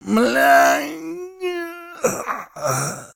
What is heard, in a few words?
death; metrocraft; customnpcs